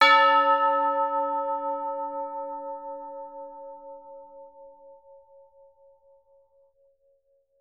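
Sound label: tubular bells A4; Best Service; Peter Siedlaczek's Advanced Orchestra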